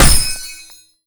gold wrench hit flesh; tf2

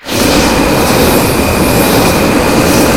fire hose start; tf2

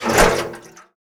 gas can throw; tf2

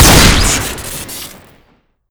shotgun shoot crit, weapons; tf2

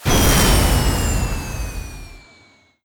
rocket pack boosters shutdown; tf2

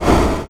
welder fire; tf2

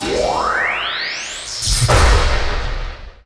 wrench teleport short; weapons; tf2; drg

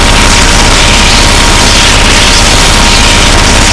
heli gun shoot crit; tf2

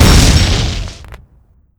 dispenser explode, weapons; tf2; dispenserizer

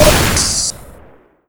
heal grenade explode; tf2

